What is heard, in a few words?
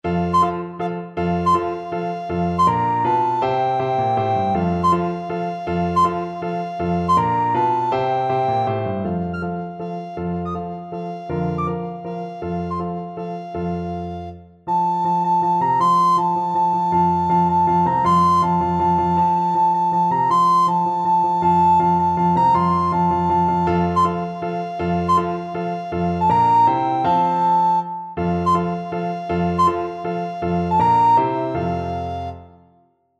vivaldi autumn, recorder